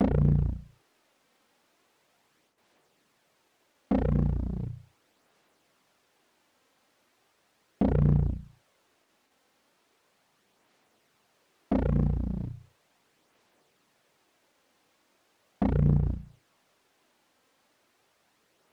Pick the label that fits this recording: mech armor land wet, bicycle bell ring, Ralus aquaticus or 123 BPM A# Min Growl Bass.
123 BPM A# Min Growl Bass